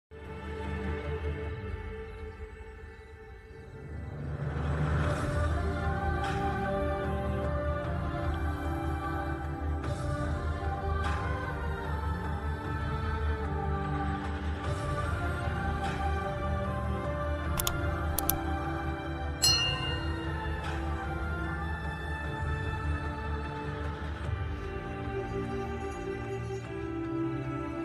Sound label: sad bgm ringtone; ooru peru bhairavakona movie